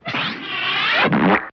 Cartoon Raspberries, vocals

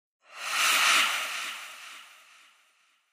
Demon Breath Thin, scary voices

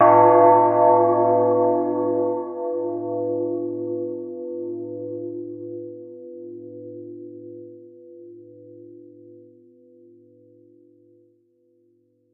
Church Bell Ringing; bell towers

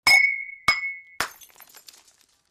Glass Cup Dropped; bottles

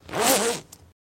Zipper Unzip Whoosh; zippers

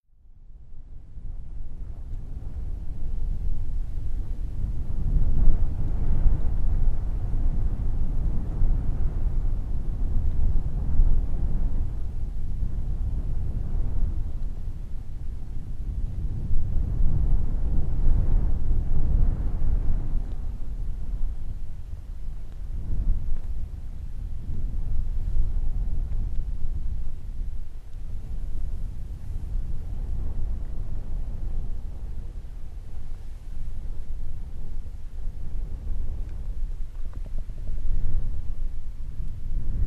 Buffeting Wind High, sandy desert & gusts

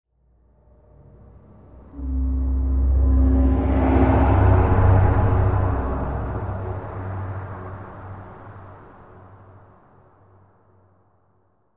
Music Eerie Tonal

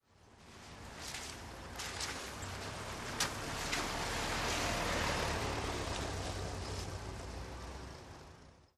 Car By Medium Speed On

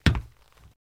FS Runner On Metal, singles metal

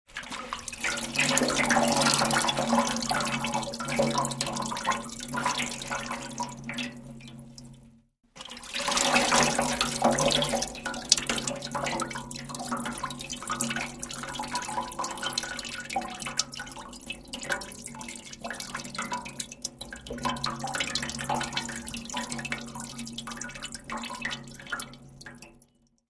Water A Metal Trough, pouring

